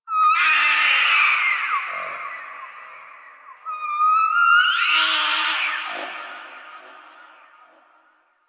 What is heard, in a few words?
Distant Zone Four Creature; Warframe; Ambience; Eidolon Plains; One Offs